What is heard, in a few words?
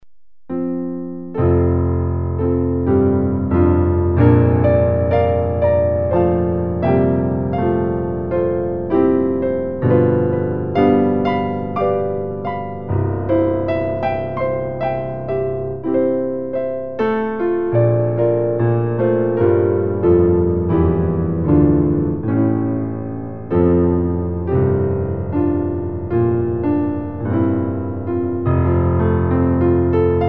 While Shepherds Watched, Piano